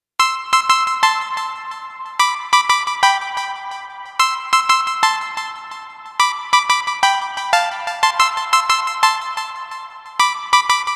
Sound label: nord lead a1 oxidation